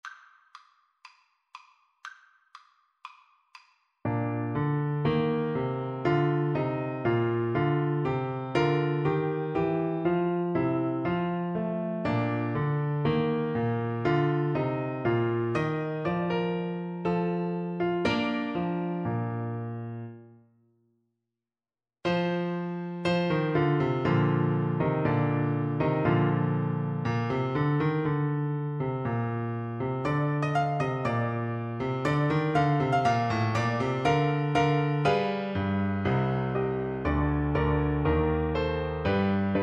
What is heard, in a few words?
men of harlech, Saxophone